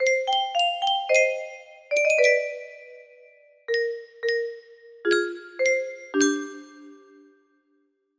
melody

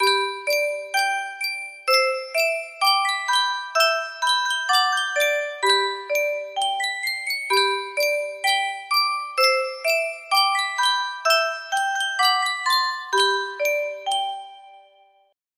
Sankyo Music Box, music box melody

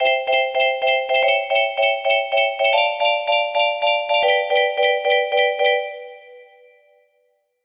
chord progression, music box melody

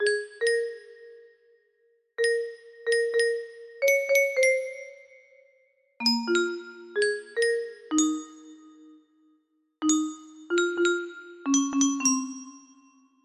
music box melody

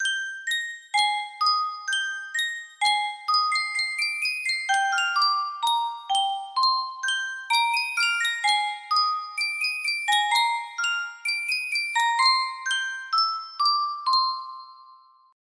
custom tune music box, music box melody; final fantasy xiv

